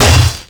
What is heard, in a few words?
hit world; weapons; 3rd degree; tf2